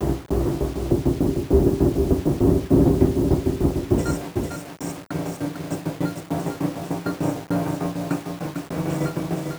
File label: Envelope Beat; Drums & Drones